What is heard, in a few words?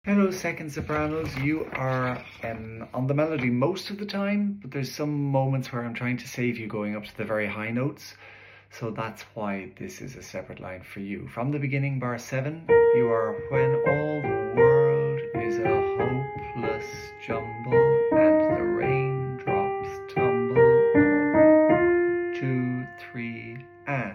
sop